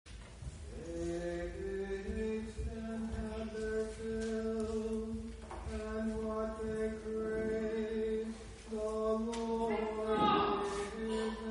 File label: Communion Antiphon